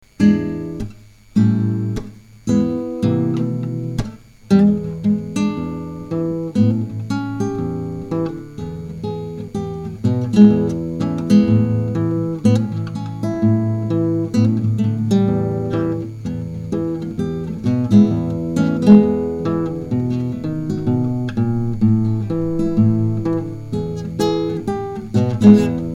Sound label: Bluesy